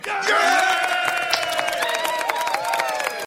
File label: cheering and clapping